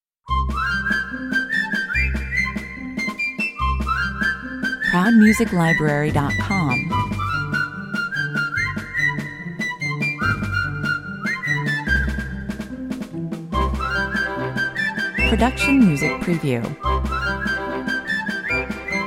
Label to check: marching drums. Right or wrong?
Right